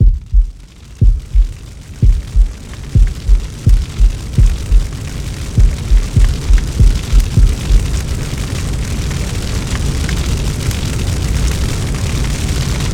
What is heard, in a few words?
Combustion; Lucius III; PC Linux